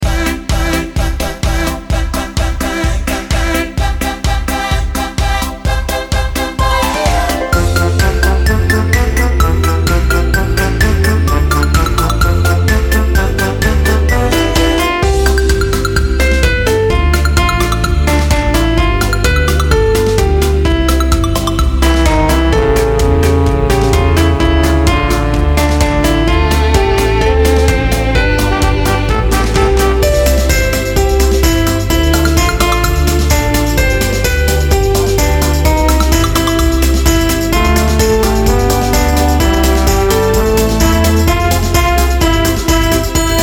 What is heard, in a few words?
karaoke